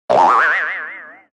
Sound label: Funny Cartoon Dizzy Boing; Silence Other Sounds